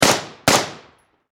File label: Warfare Machine Gun 5.6 Caliber M4A2 Double Shot Distant Perspective